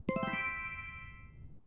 Banner Jingle; AKB48+Me

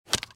arrow wood stone; out of reach treasure royale 2020 gamerip pc

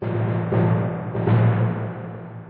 Tymp

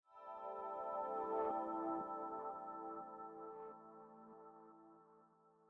Synth Swell; Legends of Pegasus

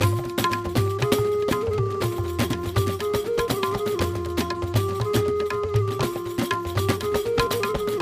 percs; music world

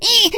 pickup rock; Scrat

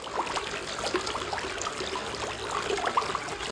Waves; lego my style preschool

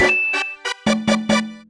Sound Bomber - Win; Mario Artist; Polygon Studio; N64

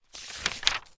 Book Turn Page; The Looker

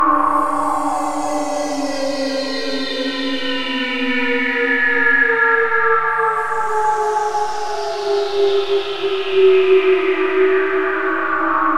falling slow; brainpipe

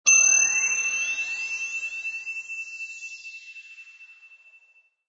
Menu load

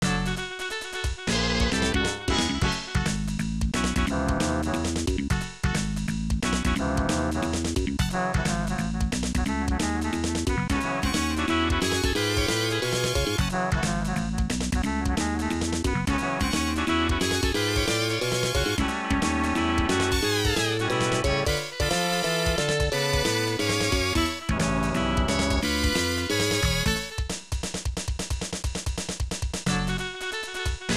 Waltz; saturday night slam masters snes

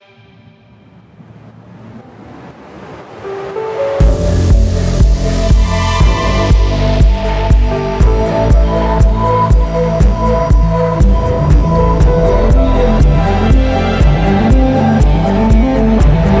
music hype stinger; Necrobarista Final Pour

